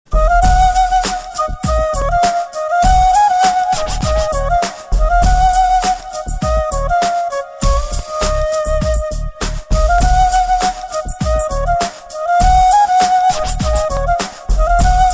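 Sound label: nice flute; ringtones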